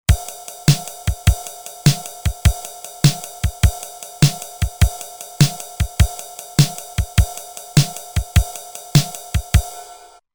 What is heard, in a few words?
Shuffle, Roland TR-505; Factory Rhythms